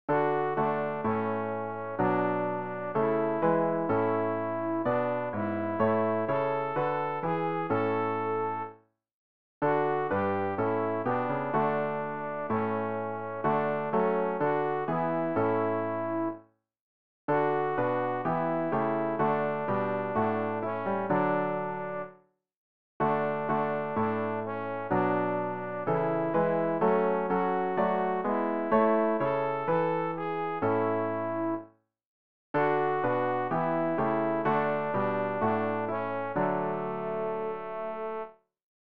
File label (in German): alt, Übehilfen für das Erlernen von Liedern; ref gesangbuch; rg 307 heilig heilig heilig herr gott der maechte